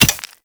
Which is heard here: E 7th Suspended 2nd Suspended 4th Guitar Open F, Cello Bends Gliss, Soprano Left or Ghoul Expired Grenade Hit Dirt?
Ghoul Expired Grenade Hit Dirt